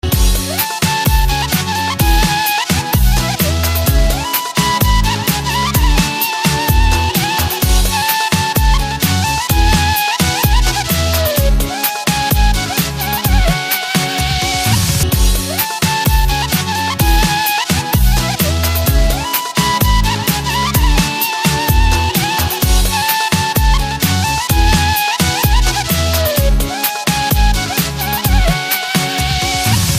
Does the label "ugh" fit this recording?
No